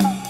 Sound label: crash; asia; TRUTH-HZ; Crowdsourced Sample Pack; Hits